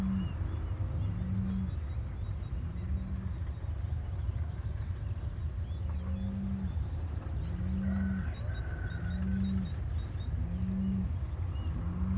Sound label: DAY BIRDS AND WIND